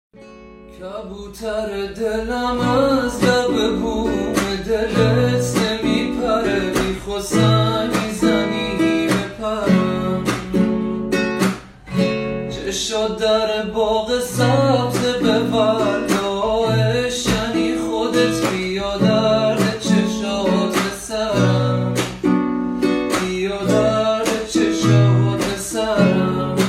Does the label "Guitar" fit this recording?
Yes